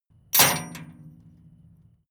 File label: metal chain impact container